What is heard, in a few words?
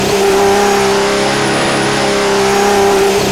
in PHIGH, F1; Japan; AOS F1 2022